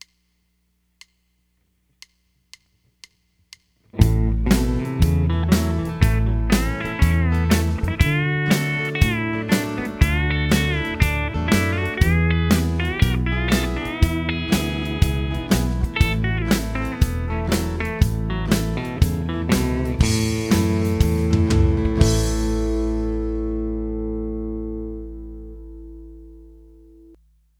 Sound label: Country Solo; Country Solo 1 Example